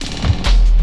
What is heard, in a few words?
LOOP SD; Ueberschall; Drum & Bass Resonance; 140 BPM